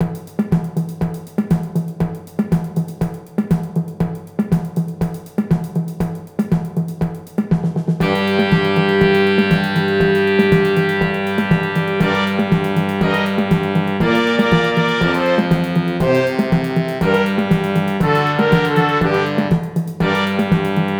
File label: samba alle